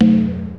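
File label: talking drum; E-MU Formula 4000 Series Vol. 2; OrbitPercMaster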